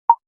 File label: typing